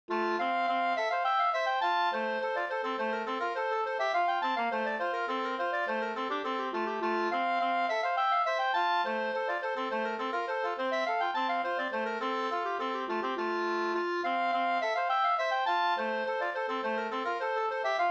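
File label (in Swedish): slangpolska, two-part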